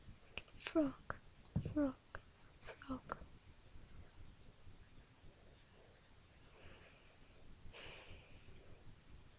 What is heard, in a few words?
frog